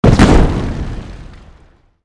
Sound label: impact explosion